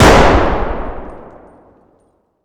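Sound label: grenade explosion indoor distant; Escape from Tarkov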